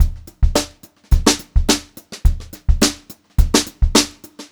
HOTROD VARI, Brushed Drum Loops; USB Soundscan vol.39; AKAI; 90 s Sample CDs

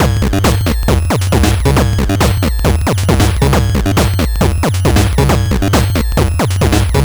LSDJ Wonkin' Loop 136BpM, Nintendo Gameboy Loops; maurice.rebell Loops

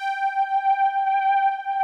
PADS; Pro-Rec - Synthsonic; Z1 PADS

